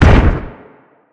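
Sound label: shotgun distant, weapons; midnight guns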